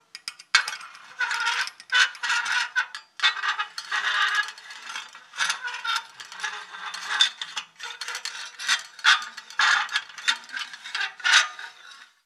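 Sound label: metal rattle squeak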